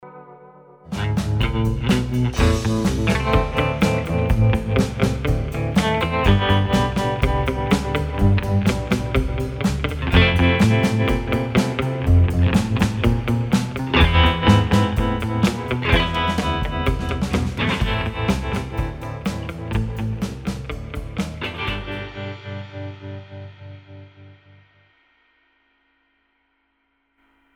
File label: Pulp Groove